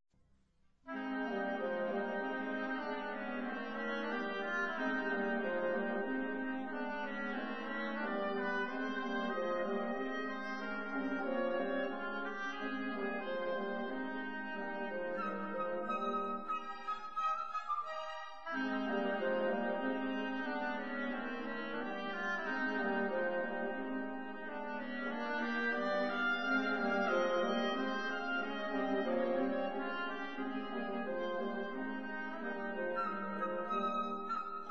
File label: oboe; western